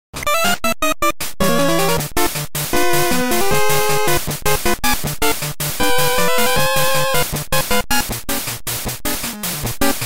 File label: encounter; team rocket